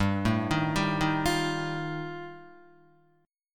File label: G 7th Suspended 2nd Sharp 5th G, Guitar; 2,0,3,0,3,1; down; 4F,4A,5C,5G,5C,6E